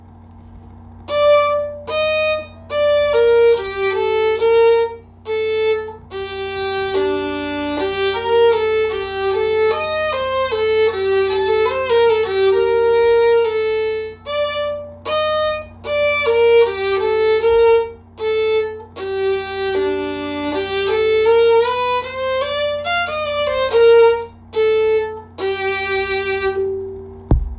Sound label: Becker Gavotte